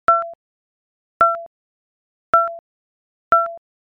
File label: drip